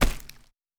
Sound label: Forest